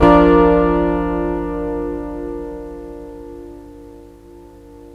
Chapman stick chord; Greytsounds

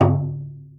sfx perc

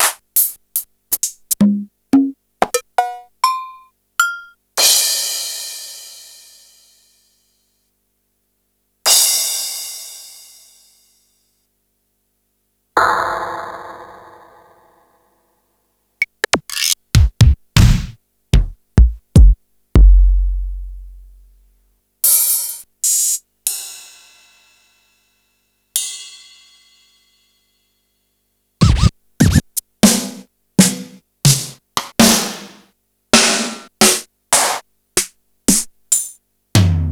Yamaha RY-8

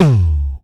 VOCDRUM, MOUTH PERC; Best Service; Hyper Dance

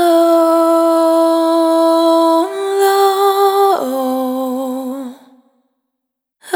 Vapor, Single Voice Melodic Loops

